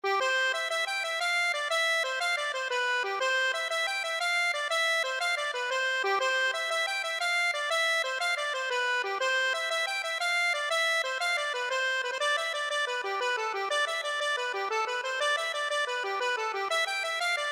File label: accordion